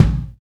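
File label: kick, Roland; Club-50 - Foundations Roland; Ext.Snare 2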